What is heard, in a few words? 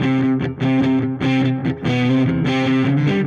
Dirty Chunk Guitar, Indie Rock